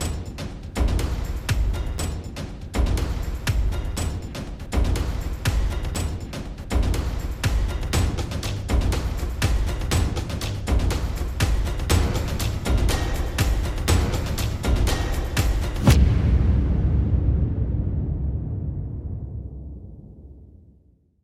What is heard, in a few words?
Hybrid Drums